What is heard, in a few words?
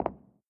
carpet footstep